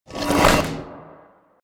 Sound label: panel SFX; free Games; Champions of Valhalla